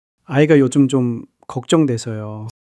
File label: struggle